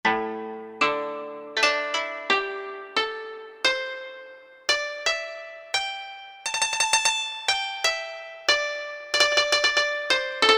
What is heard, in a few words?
Koto, Korg MSC-6S Fretted Insts; Fretted Insts 1